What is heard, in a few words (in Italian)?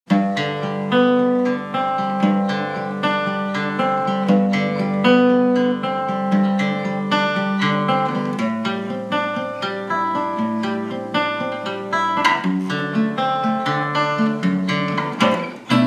Studio in G maggiore, guitar